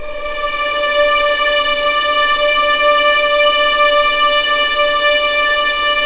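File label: D5 legato violin, left, The Ultimate String Collection; East West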